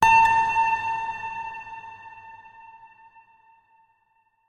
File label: Chime; PS4; Chime Sharp